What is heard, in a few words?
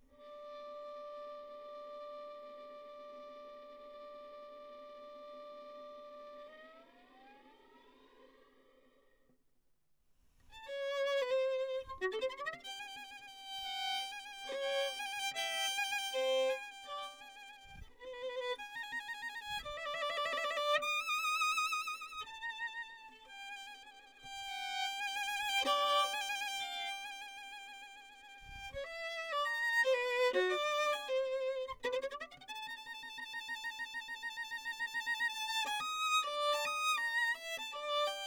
vln, pitch